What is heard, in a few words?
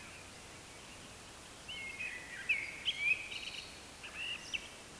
Turdus merula